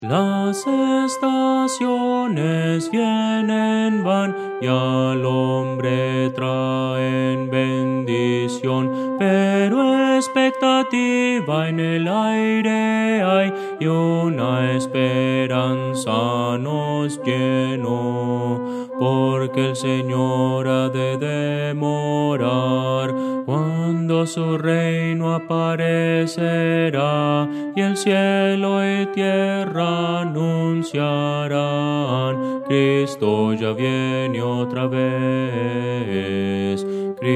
contralto

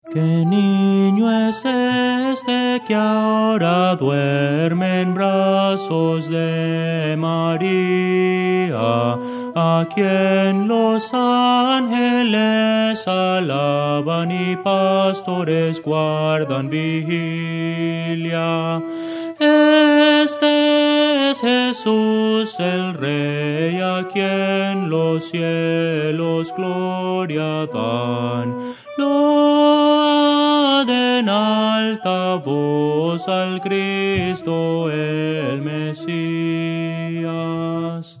melodia, himnos cristianos; que nino es este

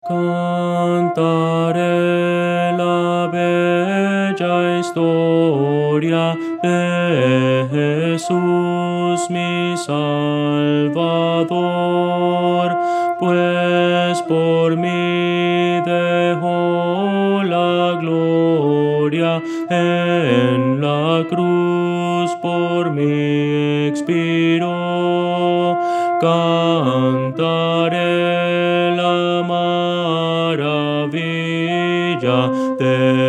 contralto; Voces para coro

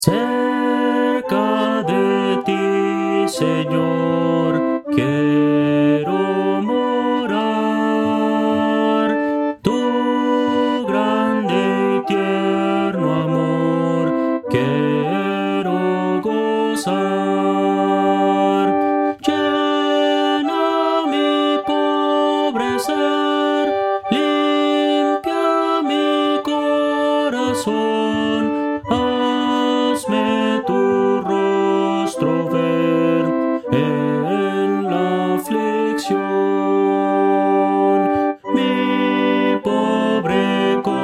melodia

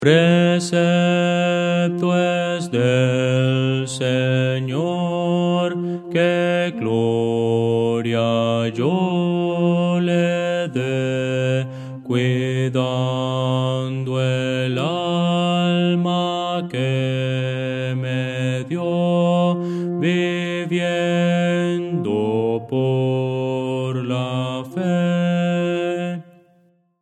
bajo

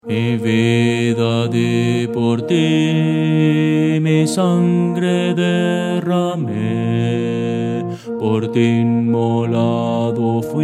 bajo, himnos cristianos

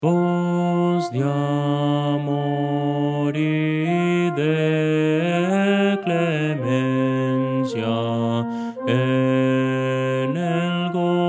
contralto

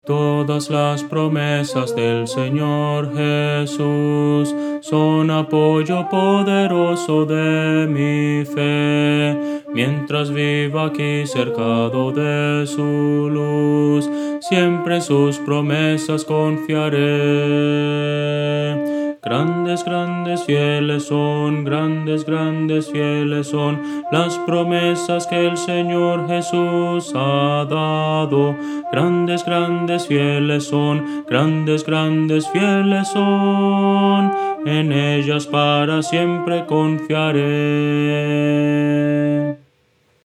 contralto

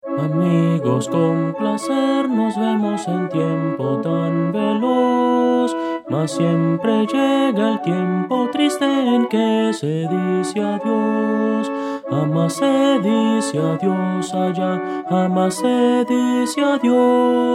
melodia

